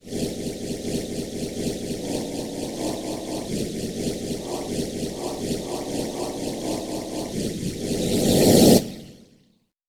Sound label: reverse cymbal; bass